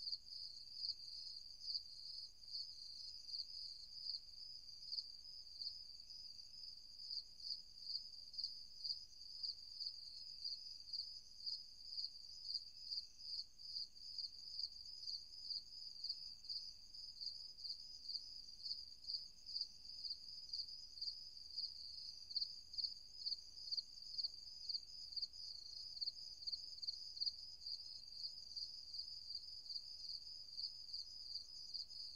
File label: Crickets Night Time Sound; Phasmophobia